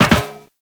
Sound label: Land Soft Metal Perforated, Footsteps; Characters; Operator